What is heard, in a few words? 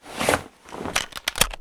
Inf Weapons Foley; Red Orchestra Ostfront 41-45